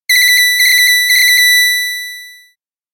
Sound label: assiduous, ringtones; standard ringtones